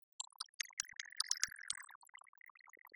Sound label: denoising glock 20dB mix